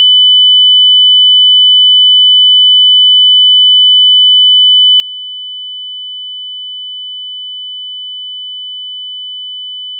loud soft; ogg; android 9.0.0

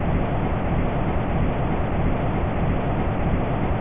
rockets; replicaisland; android 7.0.0

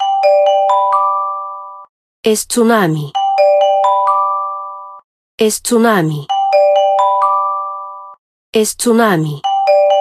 etws tsunami; CellBroadcastReceiver; android 10.0.0; es

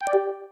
Video Stop; android 4.4.4; effects

